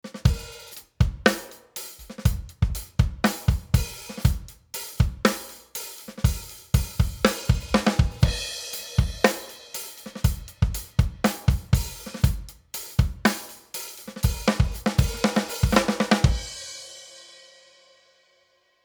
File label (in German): API Audio 2500 Drum Bus 50 Prozent Kompression Parallel